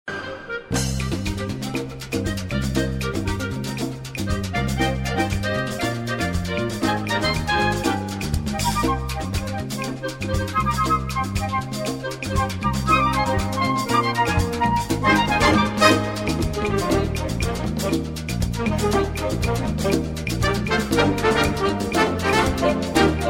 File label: wind orchestra